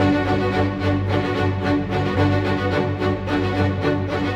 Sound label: Strings; Sonic Foundry (Sony Creative Software); Orchestral VOL-2; Modern 10